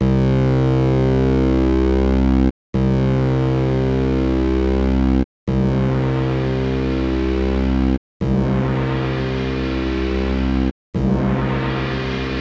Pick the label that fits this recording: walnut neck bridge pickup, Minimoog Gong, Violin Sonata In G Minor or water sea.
Minimoog Gong